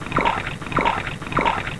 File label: stirring; Sound Effects for Multimedia - Volume 1